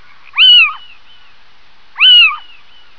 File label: owl sound; Tundra